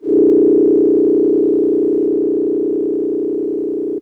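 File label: DX100 G#3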